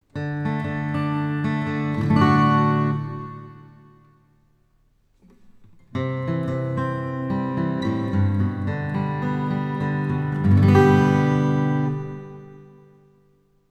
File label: AcGit Plate